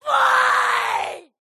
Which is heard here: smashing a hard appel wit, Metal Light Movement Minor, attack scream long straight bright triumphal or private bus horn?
attack scream long straight bright triumphal